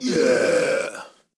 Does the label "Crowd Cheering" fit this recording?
Yes